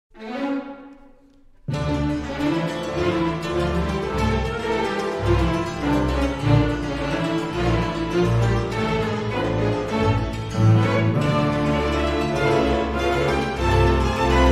Character Dance Male and Female Intermezzo; Hary Janos

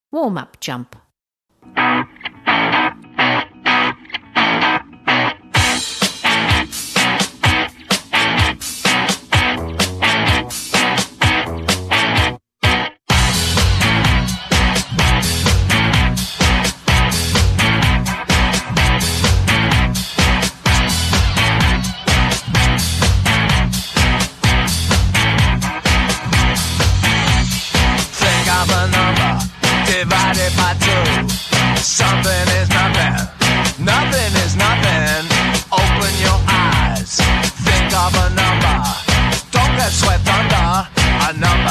Warm Up Jump

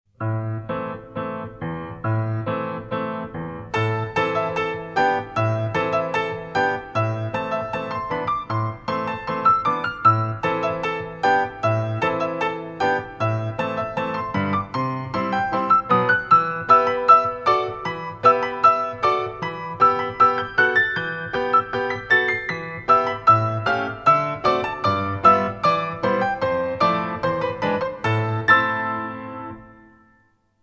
Pirouettes en dehors solo version

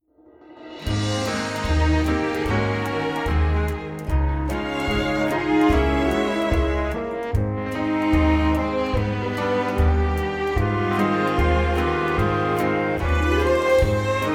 Exit Music

